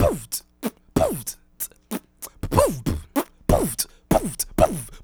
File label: Beatbox; Beatboxing